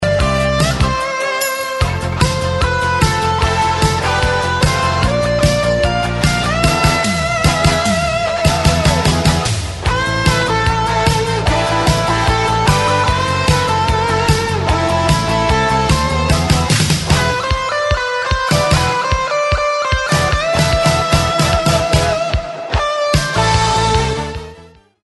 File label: Eletric Guitar Solo; jaspion